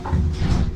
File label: door Close